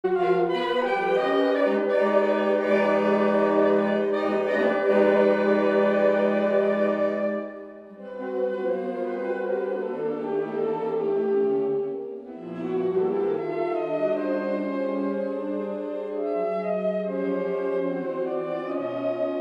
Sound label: fanfare; tetraphonics; Konzert Balingen